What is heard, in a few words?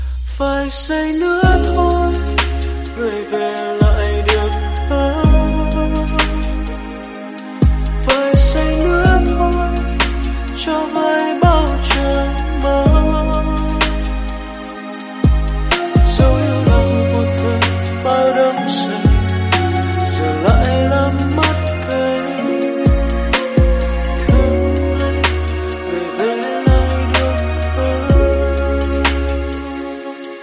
Lofi Ver